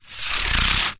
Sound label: sport plastic sports cone short scrape on concrete vibrate shake; zapsplat